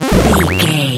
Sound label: retro powerup collect